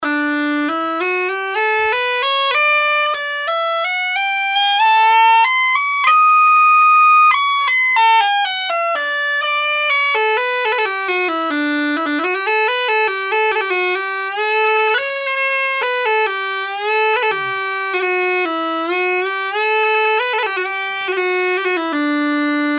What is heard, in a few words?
pipe, uilleann